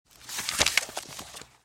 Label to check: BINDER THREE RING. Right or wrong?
Right